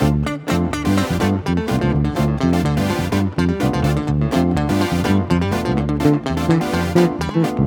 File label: Backing, 125bpm Dm, Nu Disco; Zero-G; Construction Kits; Speedy